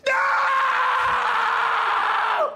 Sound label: Screem; Hat Films Ross